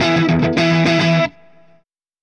Clinical Distorted Funk Guitar

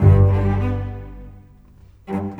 Bass, Cello, Viola, Orchestral, Rock Pop; Sonic Foundry (Sony Creative Software); Orchestral Series VOL-4 - Rock and Pop

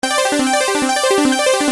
Arpeggio 140 G, Techno Synth Loops; 140bpm; Sonic Foundry (Sony Creative Software); Signals I

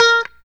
POP A#4, STRAT guitar; Spectrasonics; Hans Zimmer Guitars VOL-1; JC-STRAT POP

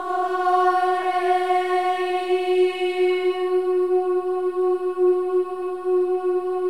AH EH; Spectrasonics; Symphony of Voices; AH-EH-EE

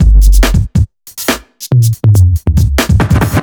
Loops Fills; Vengeance - Essential Clubsounds Vol.2

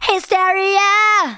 VOCALS SUNG; Techno Trance Essentials

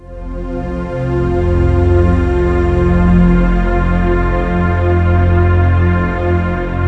SPACEDREAM; Rubber Chicken Software Sample Bank

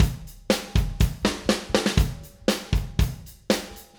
Acoustic Live Drum

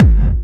hard bass drumz

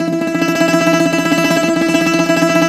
BZ TREM E 5, BOUZ.TREM, Mediteranean Traditions

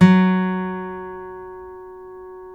STEEL F#3, FOLK STEEL Guitars; Spectrasonics; Hans Zimmer Guitars VOL-1

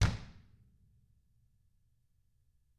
DW HARD, Drums

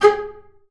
G#4, bass spicc; Xsample VOL-5

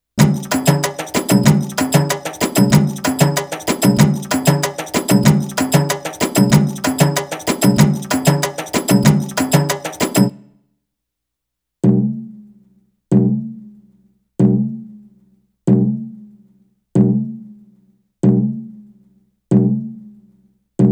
Arabic Rhythms

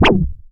MEMORYMOOG

